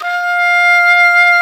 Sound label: English horn G#4; InVision Interactive; Lightware VOL-1; Instruments & Percussions